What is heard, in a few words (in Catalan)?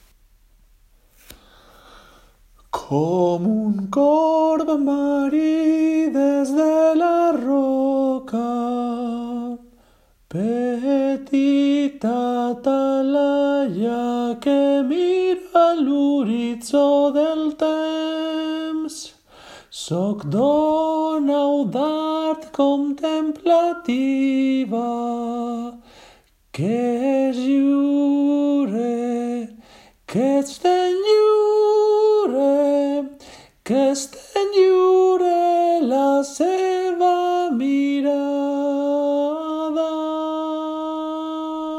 Cançó de mar SOPRANOS